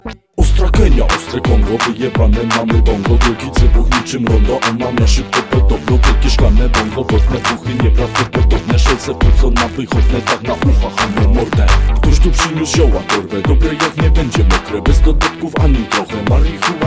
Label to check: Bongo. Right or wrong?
Right